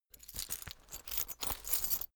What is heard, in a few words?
key ring large pocket put